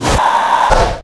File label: turbo; ships; Quantum Redshift; XBOX; jordan